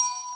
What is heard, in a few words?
Bells